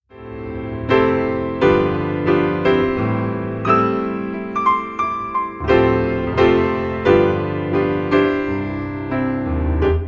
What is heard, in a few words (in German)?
vaterunser instrumental